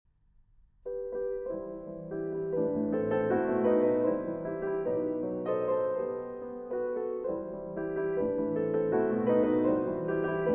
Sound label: Intermezzo in E Minor (Andantino un Poco Agitato); Klavierstücke, Op. 119